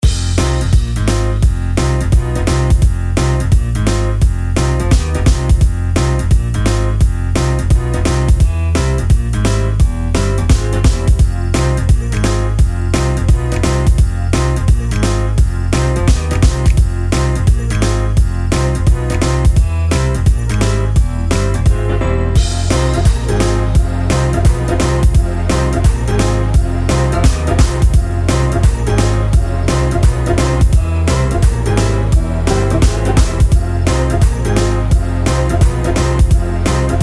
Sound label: Pause Theme Upbeat; Friday Night Funkin; Funkin Physics; Friday Night Funkin vs. Trollface; Trollge